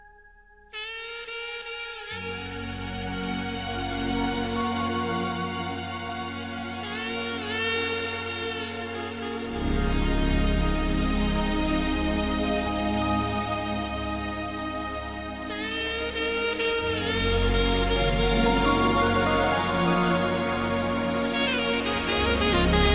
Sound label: lovetheme; nchicago